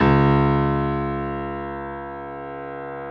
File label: piano C#1; AKAI S-Series CD-ROM Sound Library VOL-8